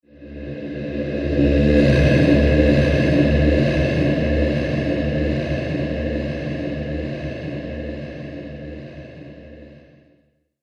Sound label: Ghost Dragon; Horror; Ghost Sound Effects